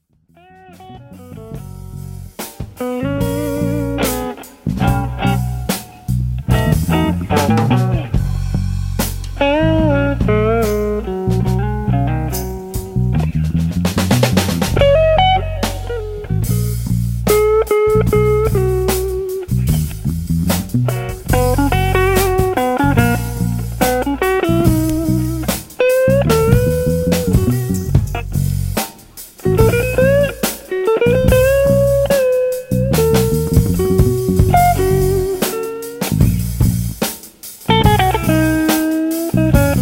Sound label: Slow Blues